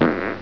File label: fart